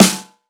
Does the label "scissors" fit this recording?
No